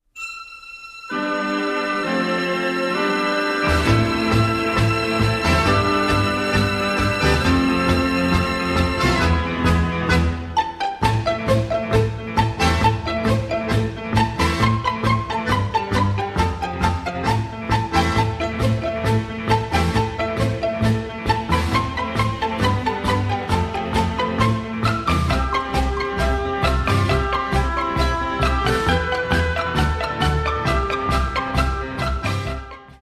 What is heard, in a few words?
Tango